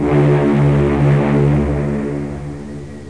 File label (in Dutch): Lage Hoorn, Kermis; Kermis Horns